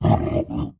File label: kagouti injury; skywind